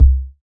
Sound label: Kick; Samplepack Produtique